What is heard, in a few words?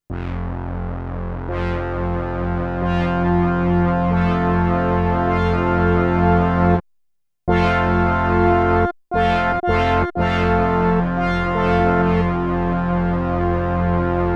OBXa Pad